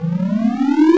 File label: gate warn; svencoop; DarkVoyage